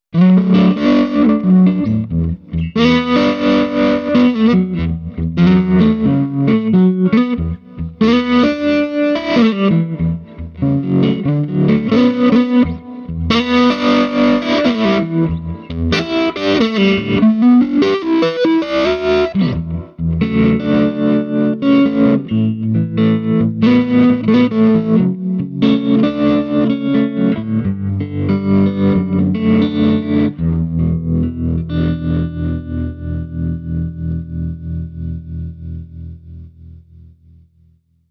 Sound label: Tele Grind Tremolo; super 30